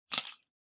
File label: Hourglass, Noise; 砂時計01-7(ノイズ); タイマー機器